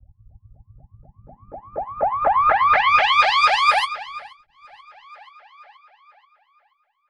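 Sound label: Filter Processed, alarm siren samples 125bpm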